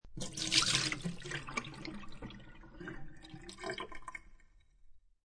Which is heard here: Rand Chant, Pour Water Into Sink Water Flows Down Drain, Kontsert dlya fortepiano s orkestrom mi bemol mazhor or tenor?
Pour Water Into Sink Water Flows Down Drain